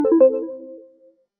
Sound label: sting